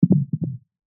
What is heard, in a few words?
human heartbeat single; zapsplat